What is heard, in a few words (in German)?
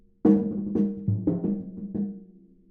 Schlagzeug schnell und toms snare